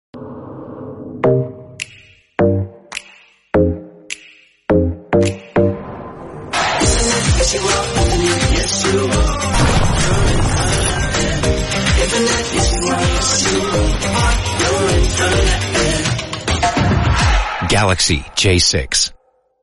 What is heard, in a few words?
thumb click call ringtone; galaxy